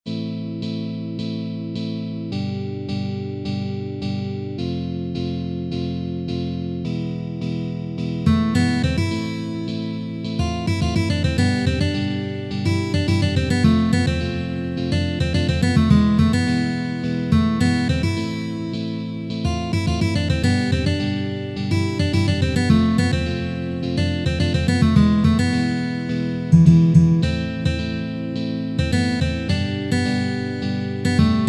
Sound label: Fast Tempo, guitar